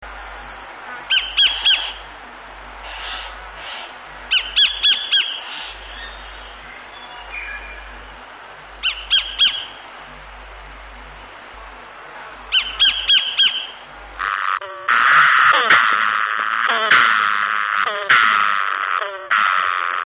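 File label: Kingfisher, Bird Calls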